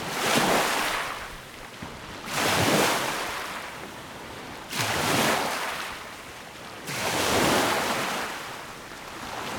beach; Golden Crayon Sounds

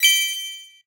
gift off; Original TK Sounds

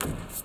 roof step; Golden Crayon Sounds

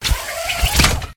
grappling hook retract; Golden Crayon Sounds

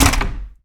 wall wood; Golden Crayon Sounds

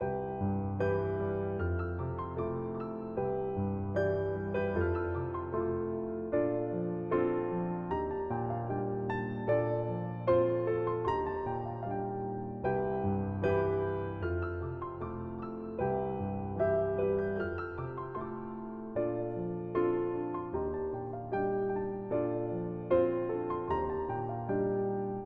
main music; RS Games Sounds

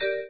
Alert; android sounds for iOS

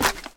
fire step; Golden Crayon Sounds

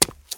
cement step; Golden Crayon Sounds

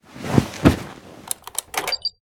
bomb place; Original TK Sounds

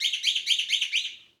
birds; Golden Crayon Sounds

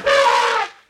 elephant; RS Games Sounds; Monopoly

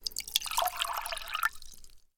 pouring; Golden Crayon Sounds

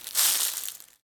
leaves step; Golden Crayon Sounds

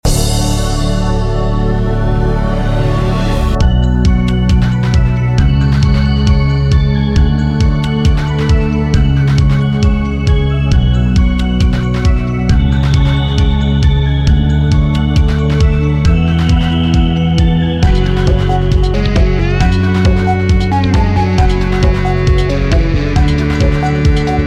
battle; Manamon Music; Manamon 2; andromeda

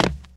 concrete land; Golden Crayon Sounds

